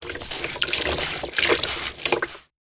household mop wet turn handle rinse drip drain plastic bucket